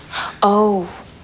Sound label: oooh; buffy